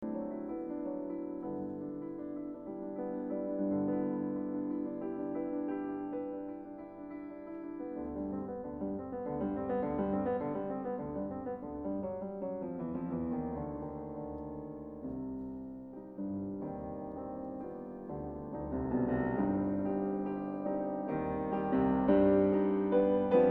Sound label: Polonaise op. 40 no. 2 C Minor